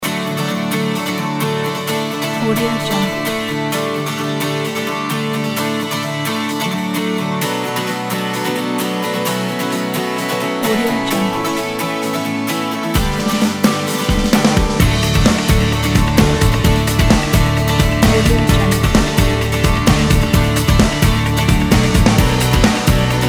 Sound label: acoustic guitar indie pop pack; romarecord